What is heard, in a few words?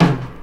good snare one shot E key